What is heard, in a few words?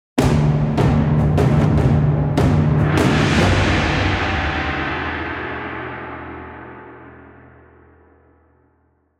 Dialogue Transition; BattleSabaku; Manago 2 Dialogue Transition